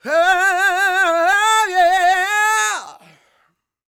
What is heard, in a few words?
SOUL, Vocal Planet, Blues & Soul; CW SOUL